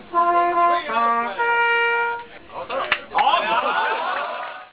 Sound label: horn